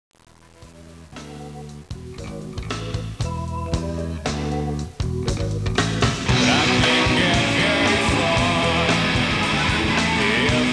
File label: blues